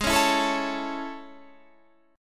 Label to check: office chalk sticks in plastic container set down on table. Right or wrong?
Wrong